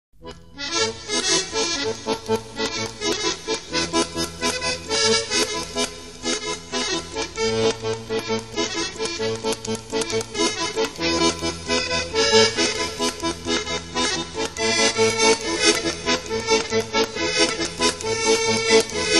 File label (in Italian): polka